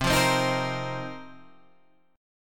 Bb Suspended 2nd Suspended 4th C

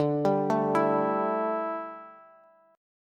D Suspended 2nd Suspended 4th D, down, Bass; arpeggiated

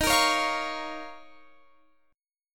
Eb 7th Suspended 2nd, Guitar, Half Step Down

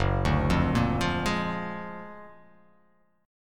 Gb Augmented Major 9th; Gb+M9 arpeggiated; JGuitar

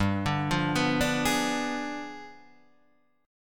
D Diminished 7th G

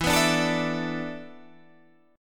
E 7th Suspended 4th

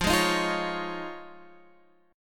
F Minor 7th Sharp 5th; strummed

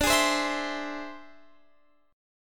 E Augmented 7th D

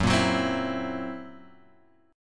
Gb 7th Flat 5th Gb down Guitar Open G

